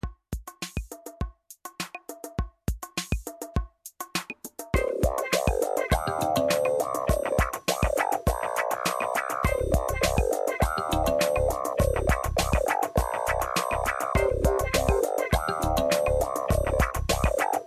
Tunnel Music

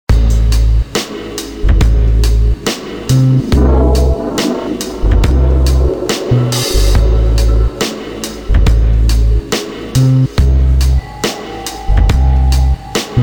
Music Bar

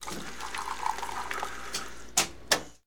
Coffe Machine Filling

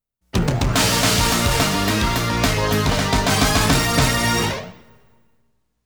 Goal BGM; HEAVEN SHUFFLE

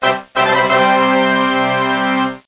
Jingle Fanfare; Gotouchi Kentei DS

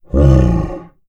MONSTER Growl Short; mono

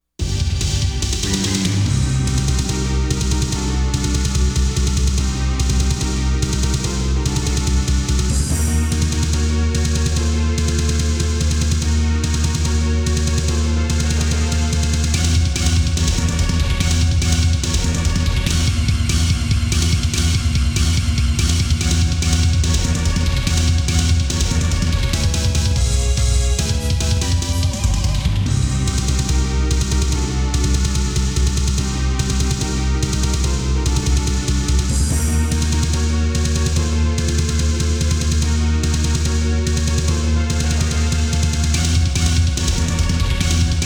Stage BGM; konami all stars 1993; dreams 1992

